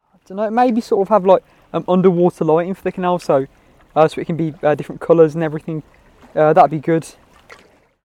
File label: underwater light; kayaklibre; 2011 Birmingham